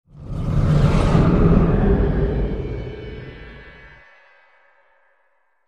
Animal Growl; Eerie And Lowered, With Chime At End And Reverb. Use For Monster.; animal vehicle growls